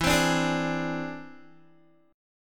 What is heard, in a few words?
E Augmented 7th E down Guitar; E+7; strummed